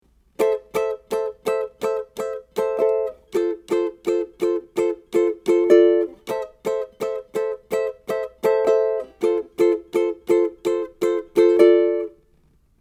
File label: Intro Strum, ukulele